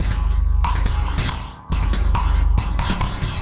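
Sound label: Saver Loop, beats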